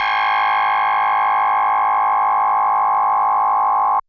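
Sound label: DX100 A#0; rocket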